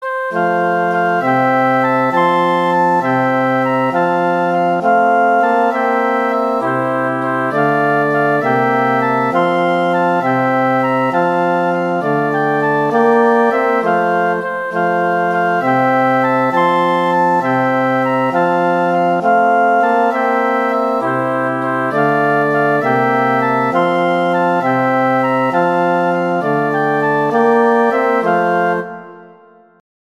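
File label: I Saw Three Ships, Christmas flute quartet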